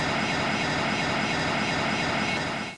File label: plane landing